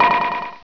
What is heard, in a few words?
WALL